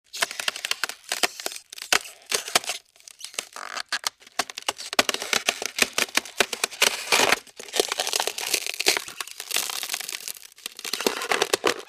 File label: Ice Cracking Squeali